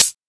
natural pedal hi hat single hit c key; hat samples

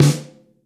Full Snare Single Hit D Key

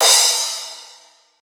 crash cymbal e key; drum sample; one shot

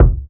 fluffy bass drum sound c sharp key

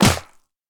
reverb hand clap sample a sharp key

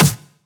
Dirty Snare Single Hit D Key; Duration 0:01; snare drum samples

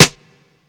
subtle reverb steel snare drum sound E key

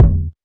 rich lows kick sound a key; kick drum samples

